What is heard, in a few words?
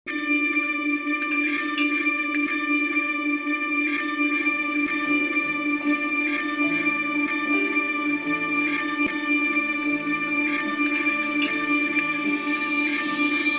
Instrumental